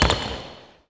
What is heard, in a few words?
Character Landing After Jump